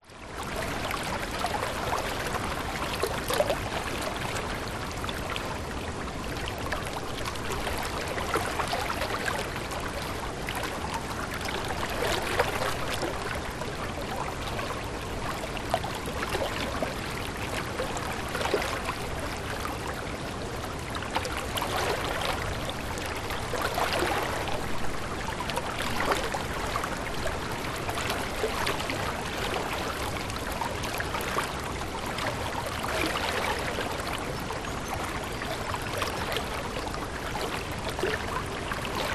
nature creek water flow gently against rock waterfall in distance